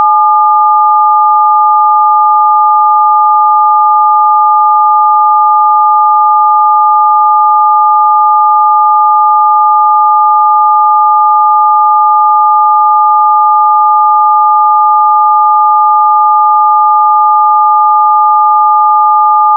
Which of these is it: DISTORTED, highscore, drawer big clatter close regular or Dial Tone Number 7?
Dial Tone Number 7